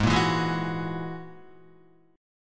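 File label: A flat 7th Suspended 2nd Sharp 5th A flat Guitar, chord; Ab7sus2#5 strummed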